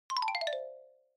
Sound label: Xylophone Slow Short 4 Down